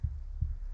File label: abyss door activation; central town; HLD